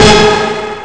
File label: Rush Synth; Amiga Soundtracker Sample Packs